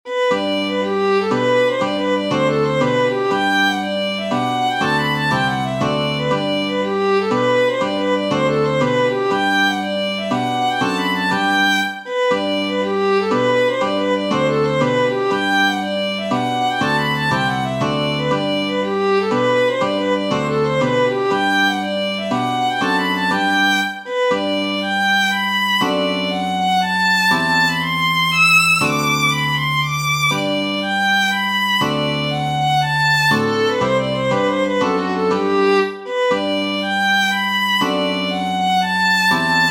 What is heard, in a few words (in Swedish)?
G-durpolska; Mikael Rapp